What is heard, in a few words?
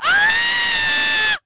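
female scream; People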